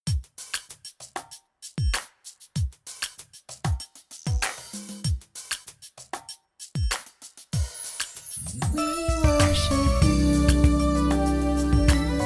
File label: ALTO